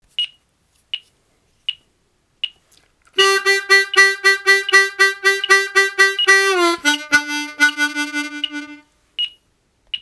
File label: Triplet turnaround